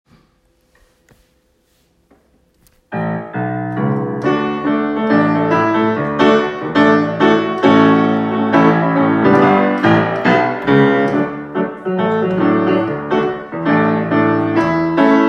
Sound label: piano; ezekiel